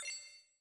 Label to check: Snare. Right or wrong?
Wrong